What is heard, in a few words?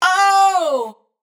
adlib Falling Oh wet, vocals; Slate Digital Omnivox Vocal Sample Pack; Adlibs